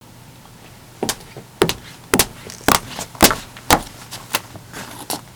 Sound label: heels